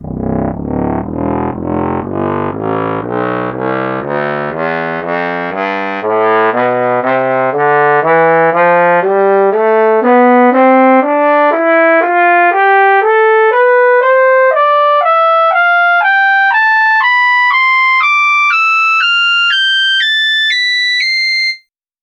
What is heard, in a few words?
trombone tonleiter